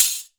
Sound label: TRIPLE CR, CRASHERS; Voice Crystal - Steve Reid Definitive Percussion